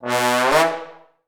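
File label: TB glissando up D#2; Best Service; Peter Siedlaczek's Advanced Orchestra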